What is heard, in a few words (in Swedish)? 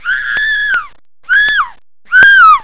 skrik